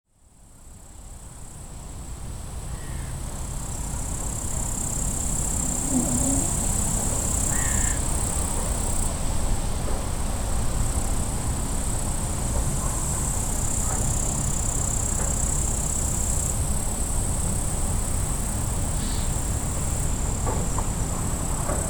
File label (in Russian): zvuki tishiny na kladbische